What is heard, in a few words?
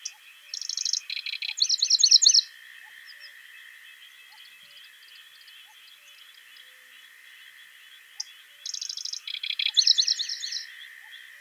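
pevchiy sverchok golos, river warbler voice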